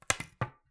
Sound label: Palette, WoodenPalette